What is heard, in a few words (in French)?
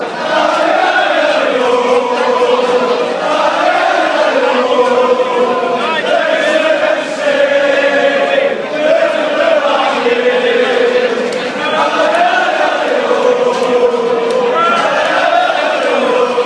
allez allez oh, chants